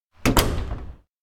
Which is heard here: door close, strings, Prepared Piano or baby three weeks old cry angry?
door close